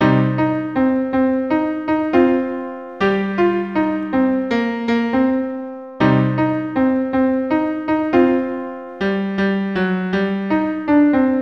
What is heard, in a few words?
Jazz Blast